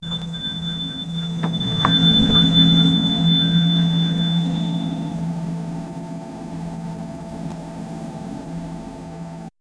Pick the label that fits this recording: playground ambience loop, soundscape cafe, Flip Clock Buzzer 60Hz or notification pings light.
notification pings light